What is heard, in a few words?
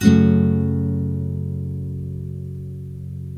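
NYLON, F# MINOR UP, CHORDS; Spectrasonics; Hans Zimmer Guitars VOL-1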